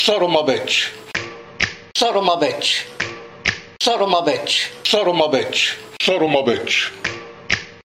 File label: sob snap; addams